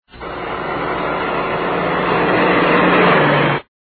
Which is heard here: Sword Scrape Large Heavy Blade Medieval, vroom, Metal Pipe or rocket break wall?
vroom